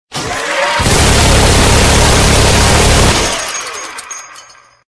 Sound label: Barrage; lancer weapon fx